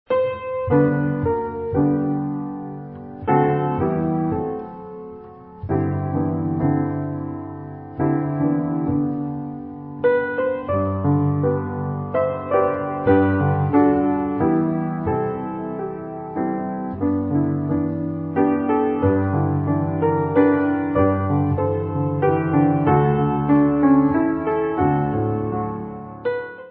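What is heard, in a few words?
Hymn; My Wonderful Lord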